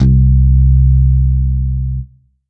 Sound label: Roland GR-1 Pick Bass C4